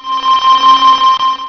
Moongate; Ultima III

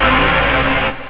buzz, rave

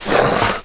ladder; PC Gamer IT CD 41; Daikatana; superfly

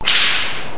Door Open; Graliens

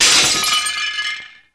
Glass Break; flstudio502

